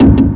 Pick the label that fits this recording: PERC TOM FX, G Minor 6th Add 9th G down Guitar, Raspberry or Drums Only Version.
PERC TOM FX